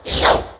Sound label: imp growl